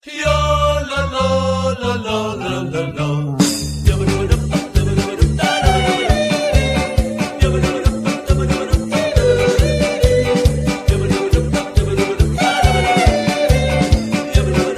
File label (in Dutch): Jodel